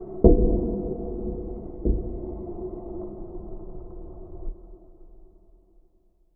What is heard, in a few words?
Cinematic Metallic Hit, Boom, Trailer, Sub; Cinematic Hits & Impacts; Jake Fielding; Haunted Metal Vol.1